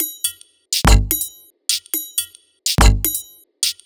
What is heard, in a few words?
Top 124bpm, House Drumloops; Zero-G House Fabrik Drumloops